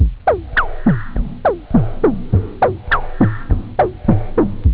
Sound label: electro, techno; OctaMED SoundStudio V1